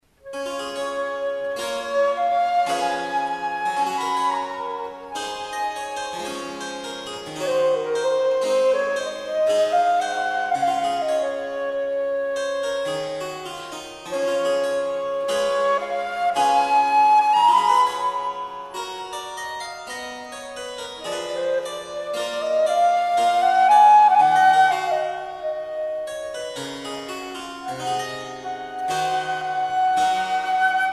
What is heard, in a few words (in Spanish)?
sonata, flauta; bach obligat